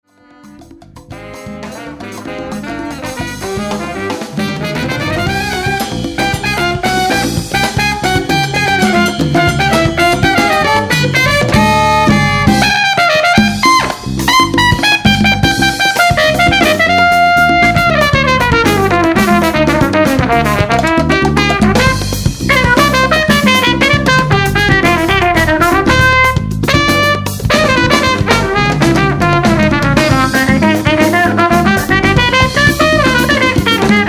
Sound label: Trumpet Solo